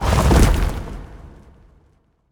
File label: environment rocks falling start